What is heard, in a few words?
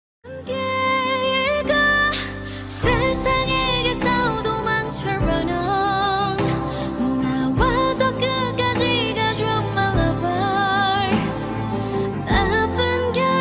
ringtone, english